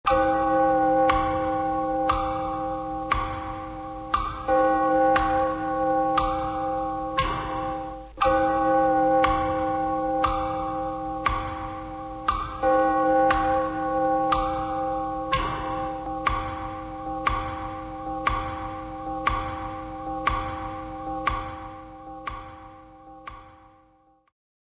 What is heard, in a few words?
clock; halloween